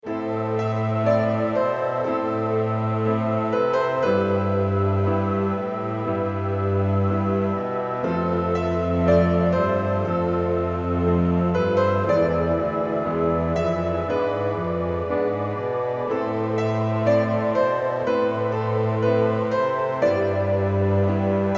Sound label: dark forest